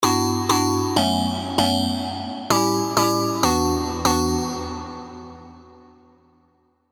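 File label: pluck, Bell; tropical pops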